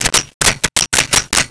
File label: Flashlight Turned On and Off; Tools